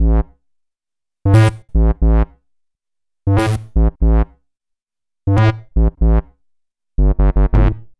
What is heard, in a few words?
Bass, EDM; Sonic Foundry (Sony Creative Software); Afterhours EDM; Retro Active